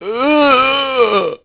zombie attack, male

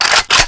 ar ready, weapons

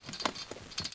wheelchair move

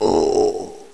zombie die

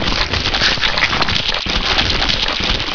chainsaw gibs, weapons

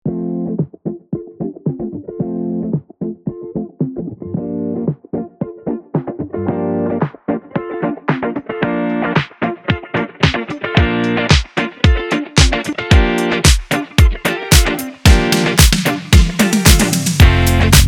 disco funky; ragemap2025

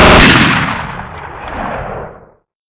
mg42 shoot

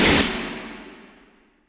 steam stop; ambience

